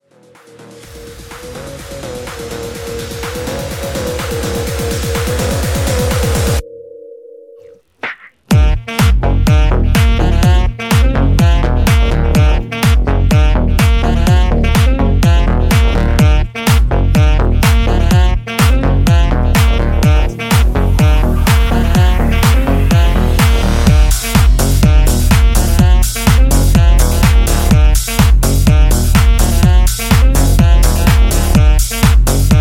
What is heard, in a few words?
House Mashup 125 CLEAN